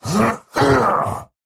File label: effort melee small; Slork